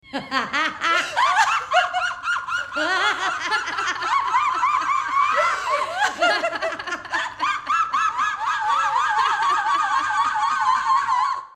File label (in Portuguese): Gaivotas Exaltadas, Risadas